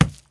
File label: Fruit Collision